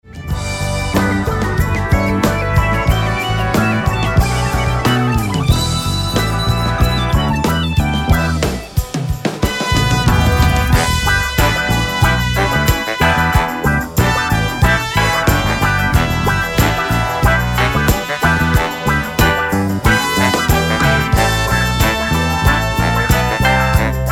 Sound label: SUMMERTIME GROOVE